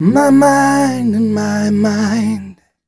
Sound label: m 128 mel e-flat, Vocals